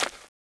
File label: zombie ghost footstep; ghostfury